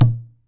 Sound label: CALF HIDE, AFRICAN PERC; AKAI S6000 CD-ROM - WorldSounds